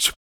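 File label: vox percussion, Vocal Planet Jazz FX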